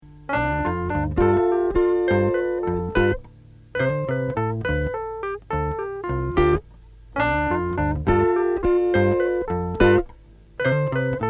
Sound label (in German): Krank Loop